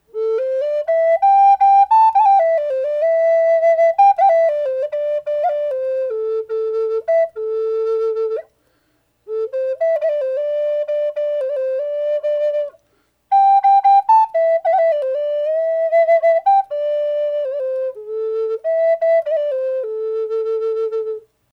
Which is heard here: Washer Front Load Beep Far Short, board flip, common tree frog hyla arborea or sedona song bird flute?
sedona song bird flute